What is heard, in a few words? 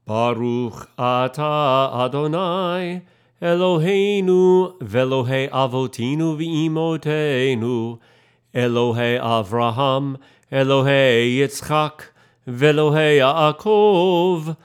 Sung; Avot vImahot